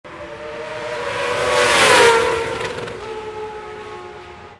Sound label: Motorcycle Passing By